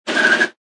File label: Car Or Motorcycle Brake, Tyre Screech